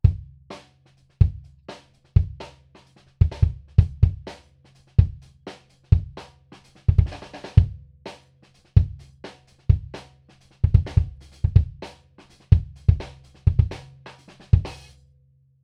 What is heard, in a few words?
FAR KICK